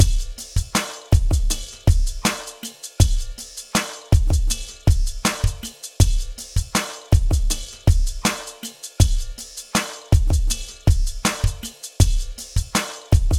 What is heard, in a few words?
drum beat 80